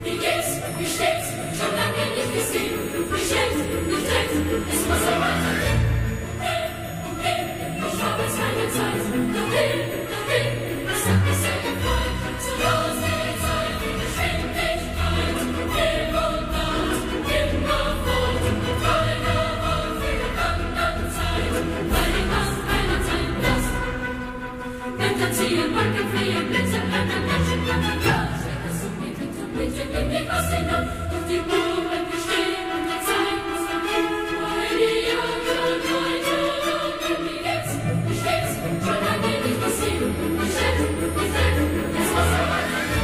Polka; Strauss Jr., Johann; Tritsch-Tratsch Polka

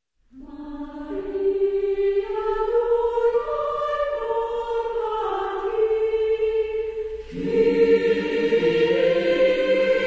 Women's Choir

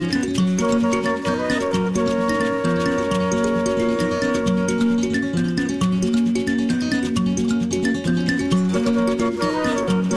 Traditional (Luganda)

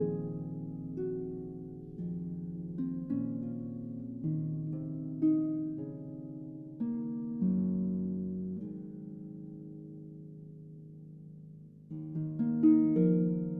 Harp Concerto Lullaby

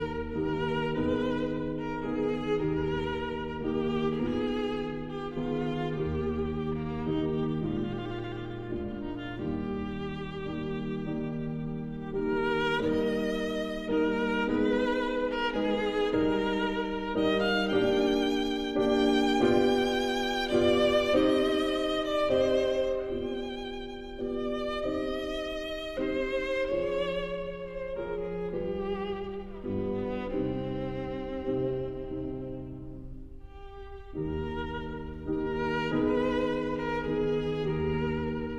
Sicilienne for violin and piano

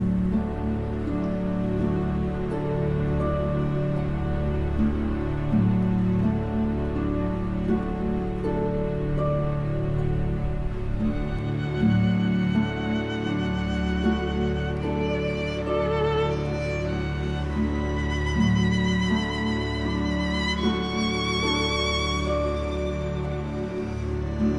Meditation for violin and orchestra; Thais

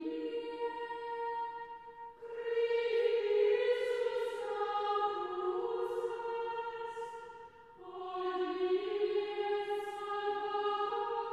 Chant Antiphon; Traditional; Chester Processional

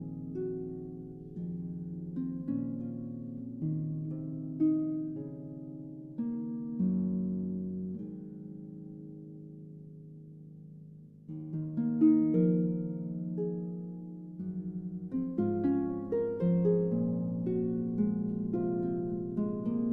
Harp Concerto Lullaby

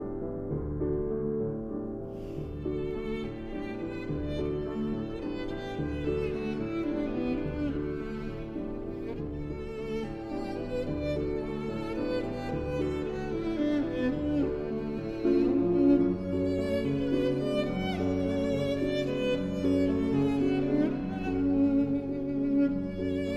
Violin and Piano in D

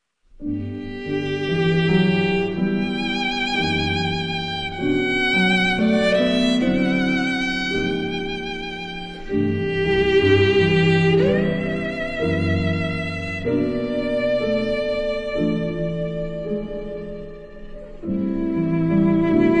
piano; Akira Eguchi

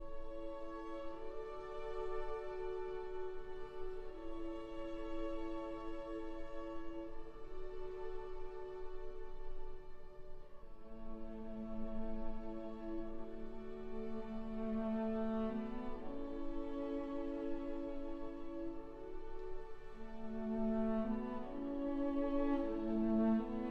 for cello and strings

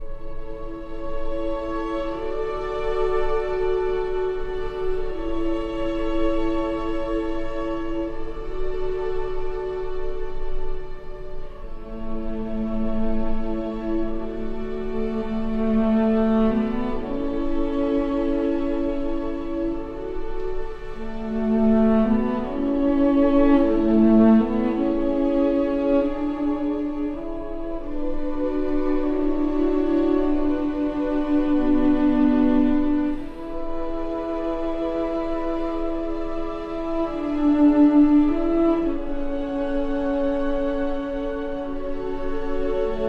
for cello and strings; Archi di Santa Cecilia

